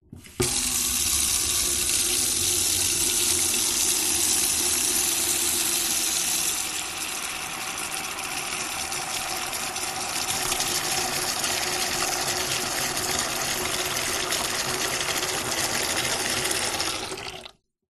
household plastic empty bucket fill up water tap in metal sink; zapsplat